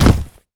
land cardboard, footsteps; player

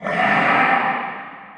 inside battle zombie, prison; Half Life Model Pack; Half Life 2; ambient levels

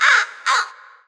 bird bird bird; Portal 2

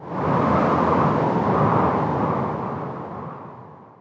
wind gust; ambient

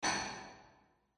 warehouse brick drop; ambient, rocks

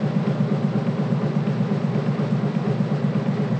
industrial loop, ambient tones

